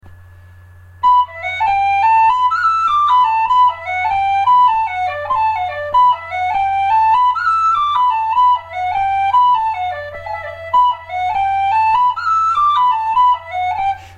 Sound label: Jig; Drumshambo